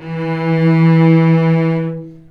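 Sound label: cellos attack E3 L, String; East West; The Ultimate String Collection; VCS ARCO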